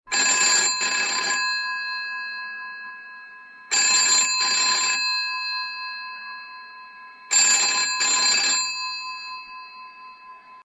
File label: tone Pips